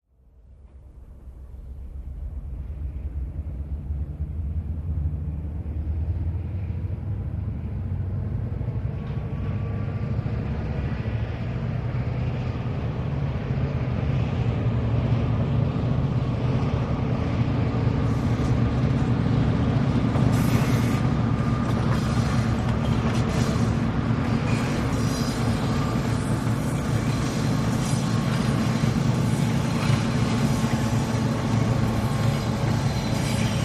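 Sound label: Medium Distant Train, diesel bys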